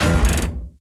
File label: Golem Recoil